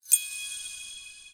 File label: bells chime